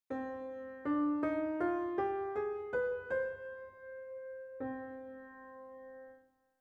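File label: C minor scale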